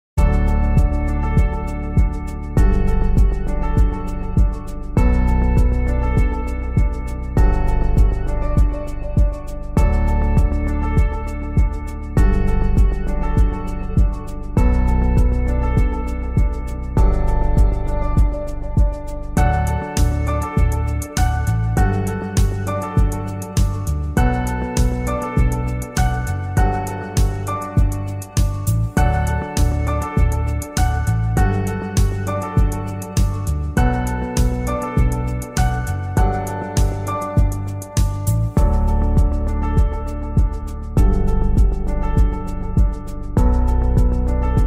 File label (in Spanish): loop corporativo